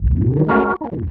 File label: ORGN 123, Keys; Houseworx